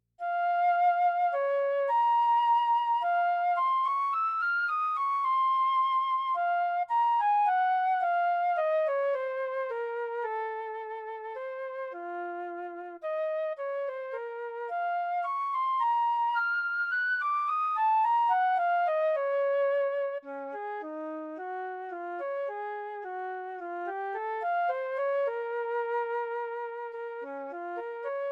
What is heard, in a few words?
Flute Lyrical